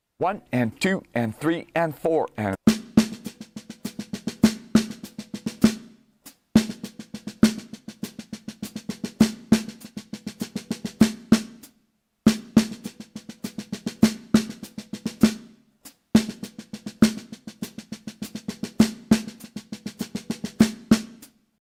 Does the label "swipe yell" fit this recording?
No